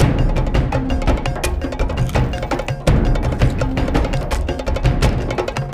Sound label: music; rayman origins 2011 gamerip; music world; bossbird part2